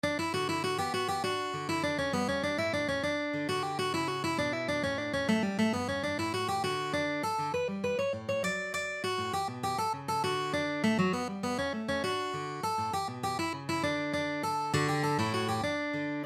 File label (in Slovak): Canarios, gitarove materialy; G.Sanz